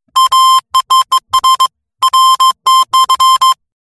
morsezeichen morse code

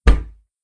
fussball ball kick sound

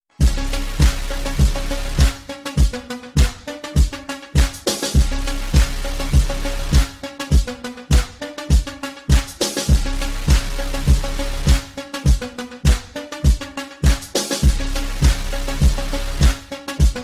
klingelton beat

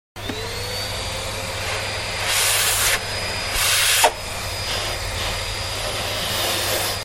haushaltsgeraet staussauger klingelton; elektrogeraet

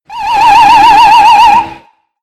klingelton boxengasse sound pithorn boxenhupe

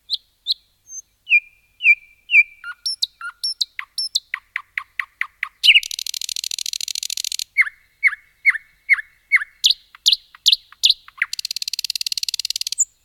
nachtigall klingelton gesang vogelzwitschern

